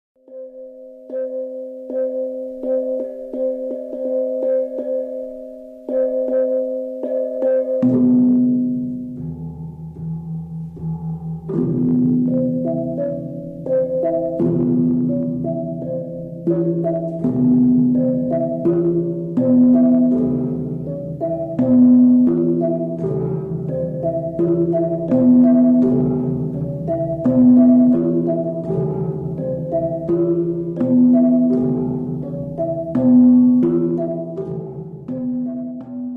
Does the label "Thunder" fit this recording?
No